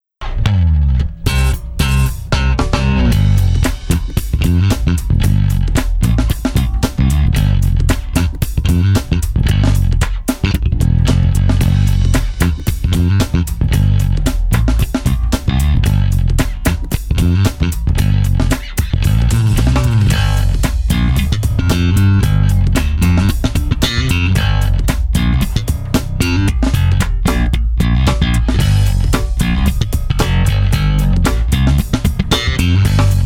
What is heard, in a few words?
sound check; lmb-3